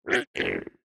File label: happy, strider; mob; minecraft